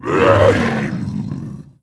hit; sinfar haks; sf effects

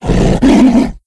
sibtiger, sf effects; sinfar haks

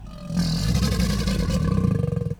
croc, sf effects; sinfar haks

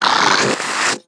look; sinfar haks

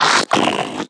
zling hi; sinfar haks

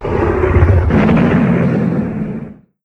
trex; sf effects; sinfar haks

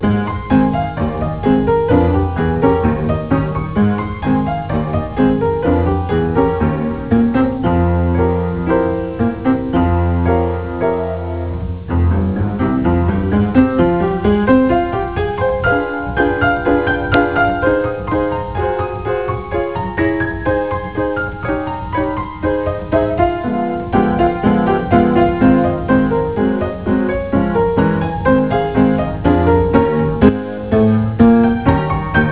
zep ragtime; sinfar haks; sf effects

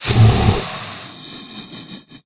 hakkar dead; sinfar haks; sf effects

